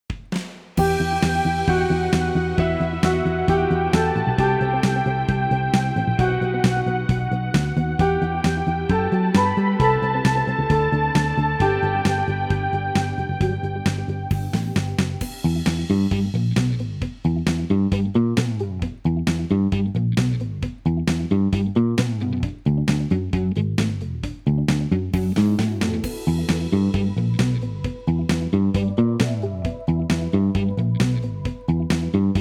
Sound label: flute